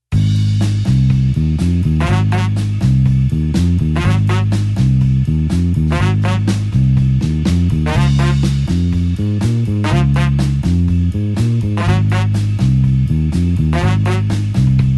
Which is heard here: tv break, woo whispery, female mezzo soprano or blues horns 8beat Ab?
blues horns 8beat Ab